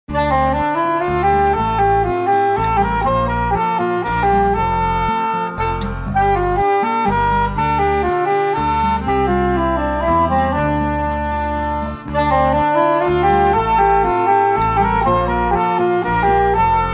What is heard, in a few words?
sax version; chanson bouvier